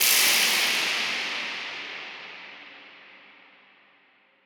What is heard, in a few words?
drill, swish